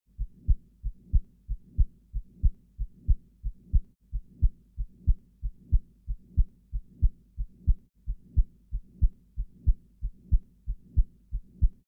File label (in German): Offener Ductus arteriosus Botalli, Heartbeat